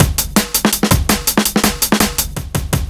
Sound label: Shuffle, Drum Loops, 165; HollywoodShuffle; M8; Electronisounds